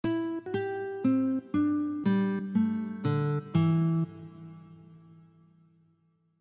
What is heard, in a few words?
slide, guitare